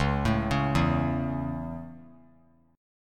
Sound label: Db Minor Sharp 5th Db; arpeggiated; Dbm#5; JGuitar